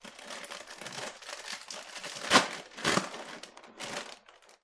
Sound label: cereal box open, Cereal box is opened and cereal is pour; Cereal box is opened and cereal is poured out. Open, Cereal Box Pour, Cereal